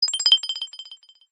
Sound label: Drop